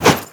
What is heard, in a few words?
fireaxe light; tfa starwars melee weapons pack